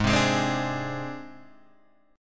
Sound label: Ab Major Flat 5th Ab Guitar Standard; AbMb5 strummed; JGuitar